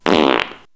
fart

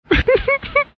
Little Girl Laugh (Giggle)

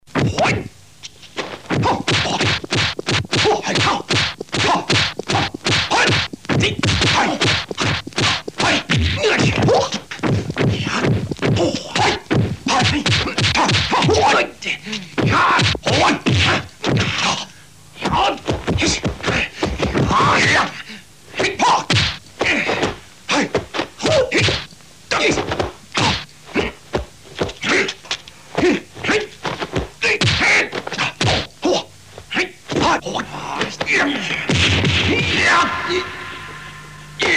kung fu fight

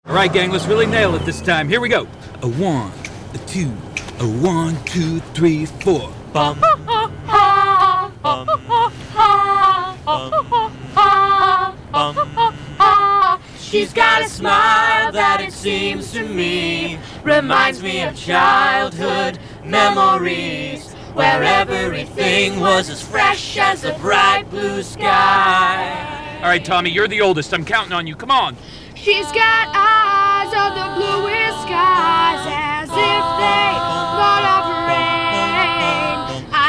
Singing In the Car -; Step Brothers

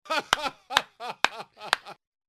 Laugh and Clap; BaD Radio

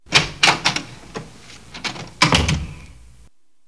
door open close

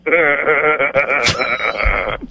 best laugh ever

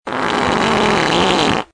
fart big

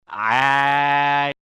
Aaaaaah

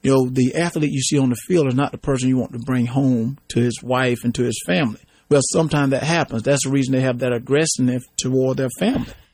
aggress sniff

